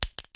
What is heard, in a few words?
bone, damage